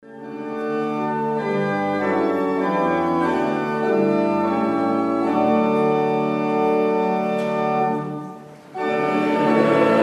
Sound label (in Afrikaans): Gemeentesang; Ld 525 verse 1,3